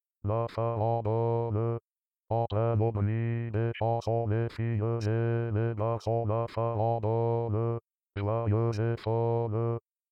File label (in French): farandole b chante